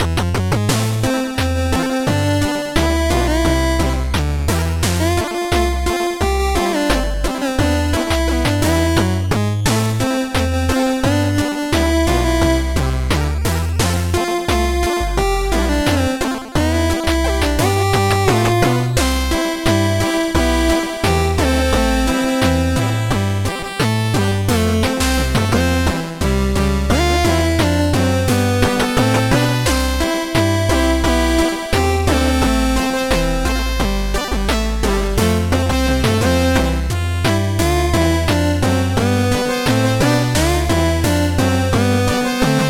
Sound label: 8Bit Theme; Famous Five